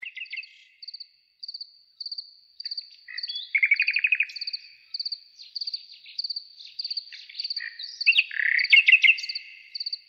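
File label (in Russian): звуки соловья и леса; Стрекотание сверчков ночью и вечером на фоне природы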